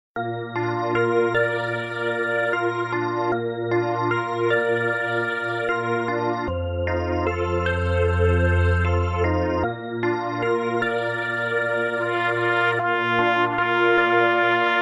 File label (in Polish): ave maria 1 trabka